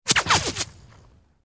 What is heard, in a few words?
Distant Multiple Bul, bullet bys